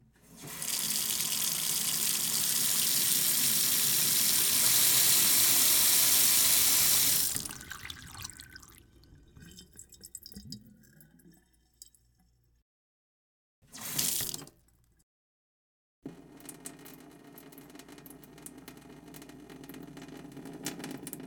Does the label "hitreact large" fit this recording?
No